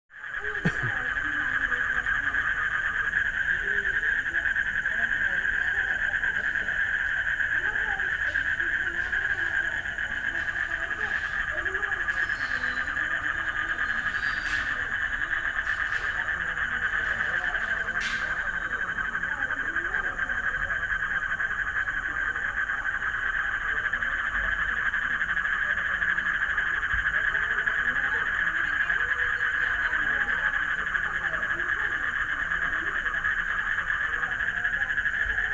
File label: frog sound; Forest Night Ambience